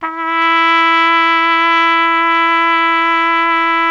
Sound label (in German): TROMPETE E 2, TRUMPET; Best Service; World Colours